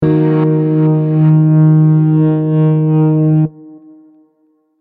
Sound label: transition note